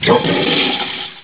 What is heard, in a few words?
Solitaire Flush; mashimaro